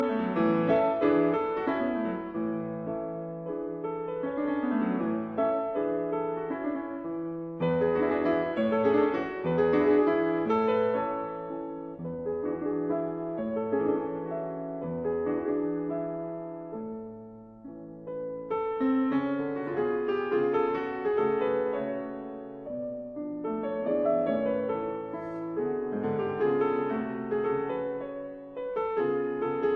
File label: Mazurkas in F sharp minor Allegretto non tanto; 10 Mazurkas Op 3 No 2